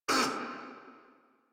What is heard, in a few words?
Pain Corrupted Grineer Male